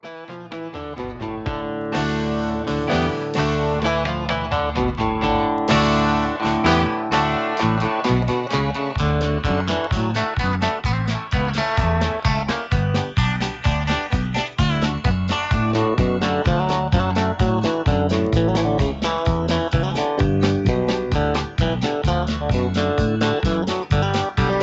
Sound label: Instrumental